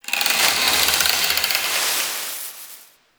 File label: Exterior Tree Wood Creaks Angry; Warframe; Duviri